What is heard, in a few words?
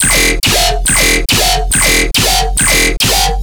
Bass Loop E 140BPM, Dubstep